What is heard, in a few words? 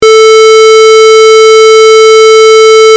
square 440